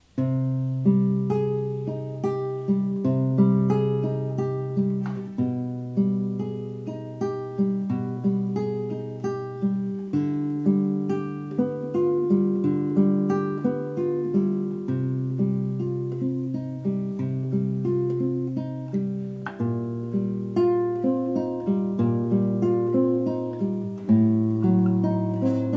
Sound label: dry signal, guitar; prelude in c minor